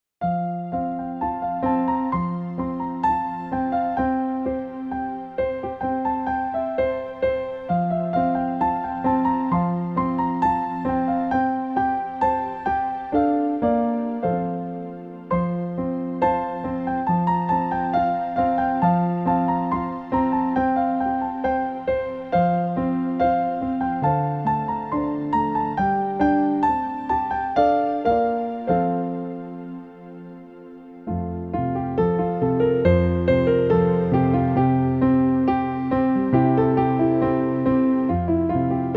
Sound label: Christmas Piano Music, Simple Gifts